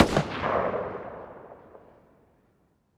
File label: m4a1 distant; weapons; Counter Strike Model Pack; CS:GO